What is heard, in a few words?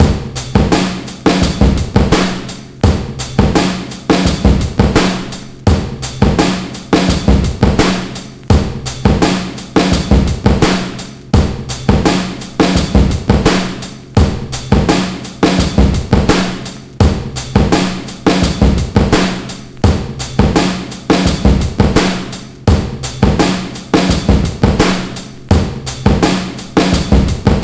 35 PHAT BEAT 84.6 bpm, Loops Breaks; POTBreaks